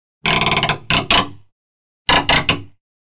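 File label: nail pulled out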